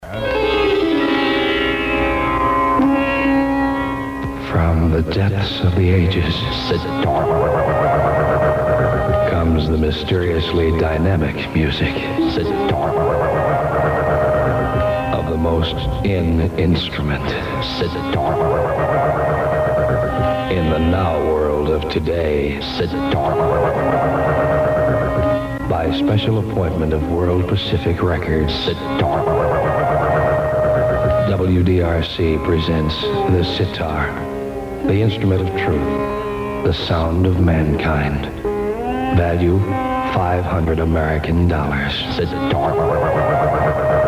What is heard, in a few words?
sitar